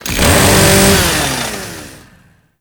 Saw Melee Swing Long; weapons; Kuva Ghoul Saw; Warframe

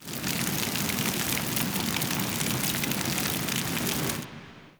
TNW Lua War Room Fire C; The New War; Cinematics

